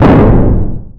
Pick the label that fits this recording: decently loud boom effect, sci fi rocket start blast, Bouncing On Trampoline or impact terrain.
decently loud boom effect